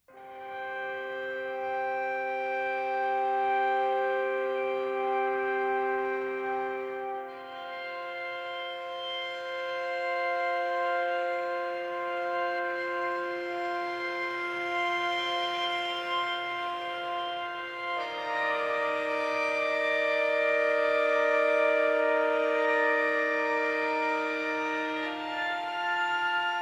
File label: violin